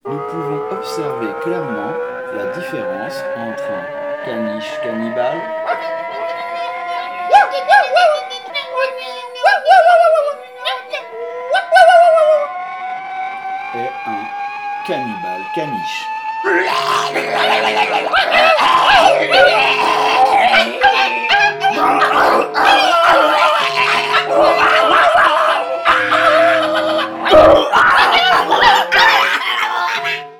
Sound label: jingle; cannibal canniche; invisible illusion